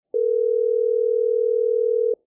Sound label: voip ringback